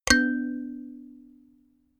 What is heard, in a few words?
notification kalimba third